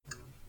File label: mouse Down click